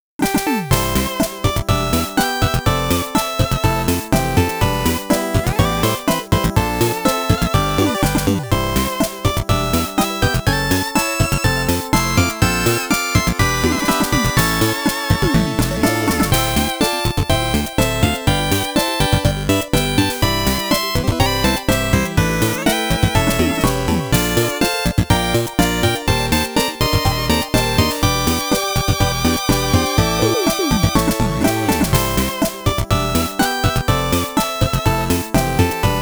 (8BIT ARRANGE VERSION); Mamonoro Kai New Theme Rare Tracks

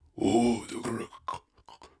Waterway; Hollow Knight (gamerip) (2018); Gs Waterway

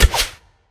Attack Arrow Throw; Legend of Keepers

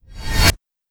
Fight metal swoosh

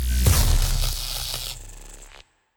Electri Coil; Legend of Keepers (gamerip) (2019)

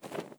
Hopper Jump

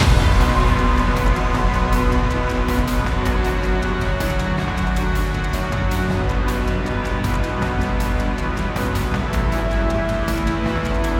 Battle

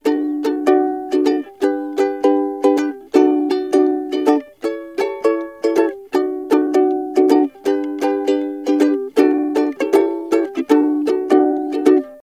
Ukelele; SpongeBob's Next Big Adventures Online

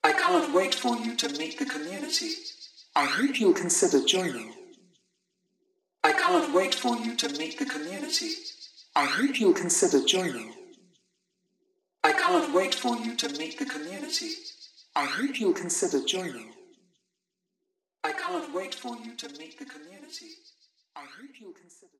Glitch

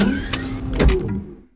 Fsdoor

